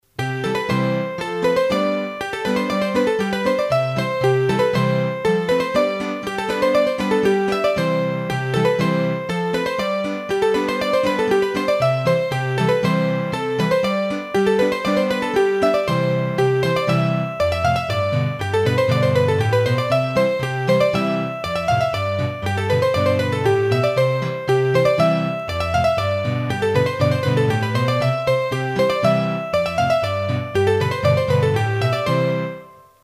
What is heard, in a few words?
Polka, hurdy gurdy; Polka du Diable